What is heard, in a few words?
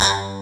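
PULSE SAW BASS; Pro-Rec - Synthsonic; TRINITY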